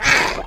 child angry roar

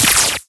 red lightning weak

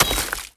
kick

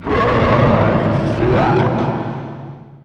rise up; satan

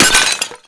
pot break

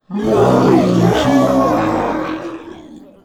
death; finalmother

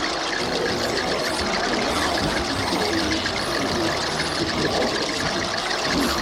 death skulls attack loop

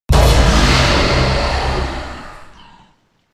devil card

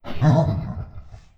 laugh; finalmother

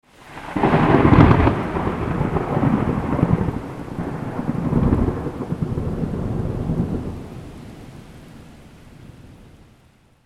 thunder

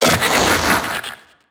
death; hollow

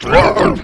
monster grunt

